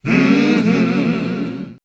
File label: Voice Spectral, H PHRS